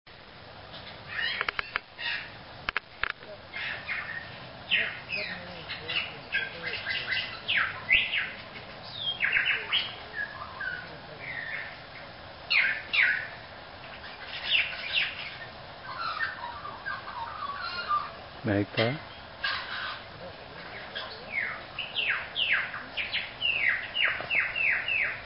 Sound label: Lyrebird